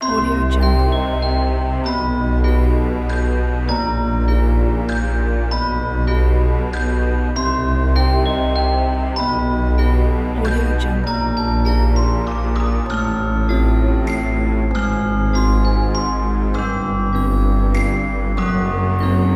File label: spooky halloween